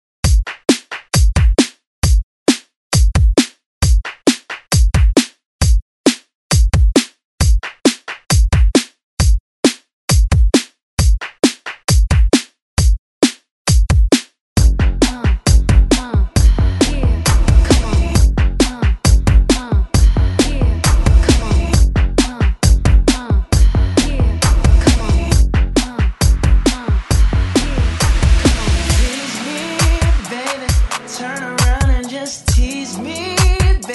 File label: Upbeat Redrum 134Bpm